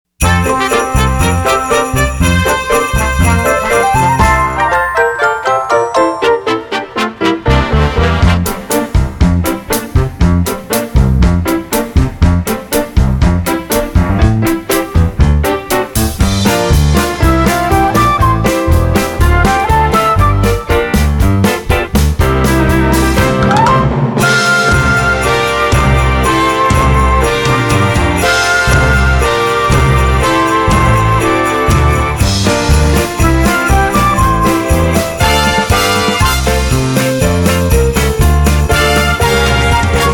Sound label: reprise lights jingle bell hill accomp